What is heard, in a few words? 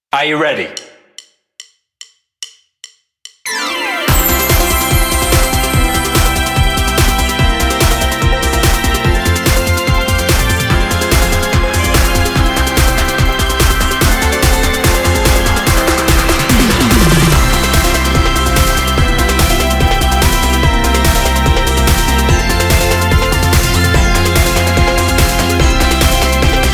bpm145 flight